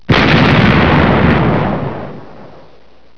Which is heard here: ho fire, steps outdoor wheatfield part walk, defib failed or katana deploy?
ho fire